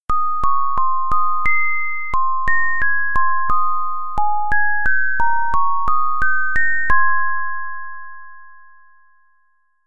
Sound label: sine